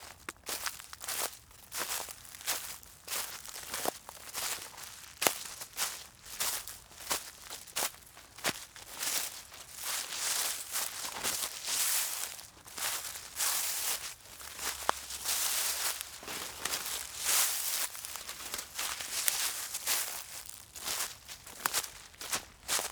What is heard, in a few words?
Forest Dry Leaves Sticks Walk Montage